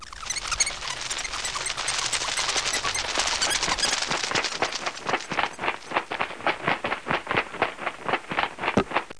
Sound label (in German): Fledermaus An